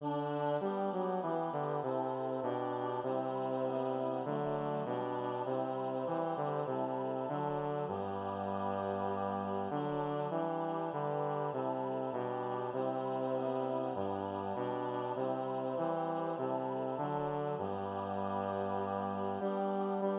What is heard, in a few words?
Bass; Fulda; Choir